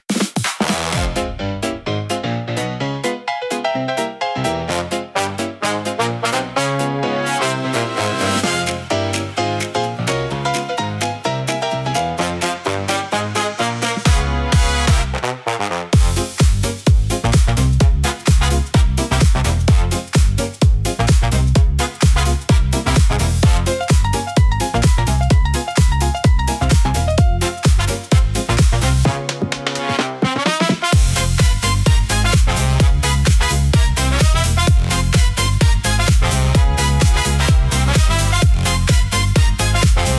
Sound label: Brass Pocket Shuffle, electro swing pop